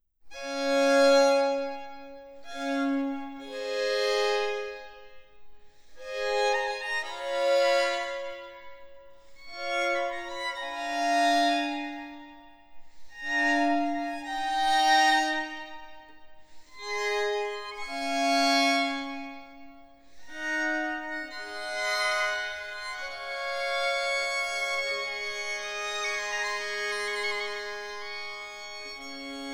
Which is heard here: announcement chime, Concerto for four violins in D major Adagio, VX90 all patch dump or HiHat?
Concerto for four violins in D major Adagio